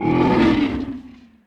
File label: violet dragon; FlyForInsanity